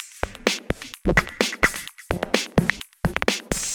Minimal Loops

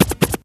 Vinyl FX and Scratches, FX Scratch

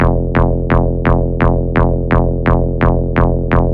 Melody Kits 128BPM Root F; Vengeance Samples

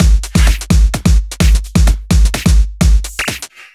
Big Fill Ins 128BPM; Vengeance Samples

